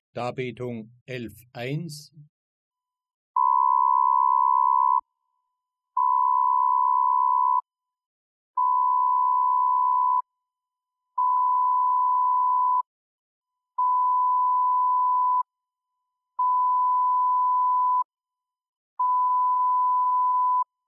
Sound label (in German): Rauhigkeit, Modulationsgrad; Die Abnahme der Rauhigkeit